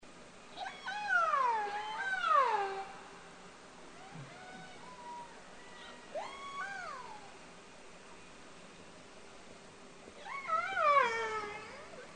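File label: transient killer whales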